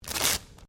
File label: Sticky Tape Rip; common tape; Offices